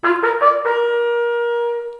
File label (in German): ecke vor, jagd